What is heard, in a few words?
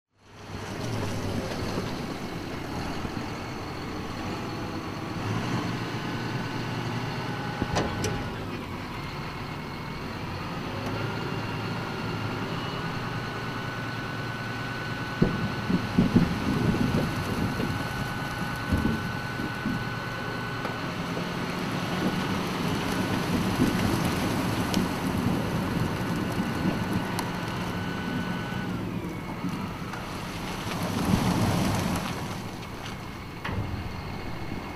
lorry loaded with tonnes of sugar beet tips up trailer so all the beet tumble out